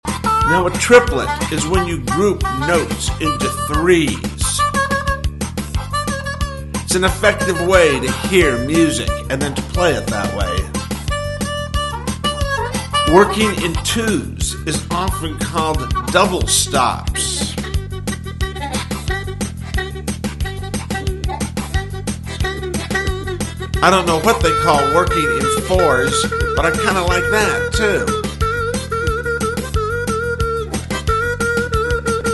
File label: Triplets, harmonica